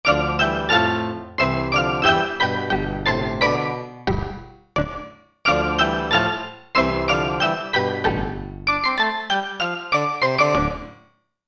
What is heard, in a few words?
2 voices; Korg MSC-12S Synth 3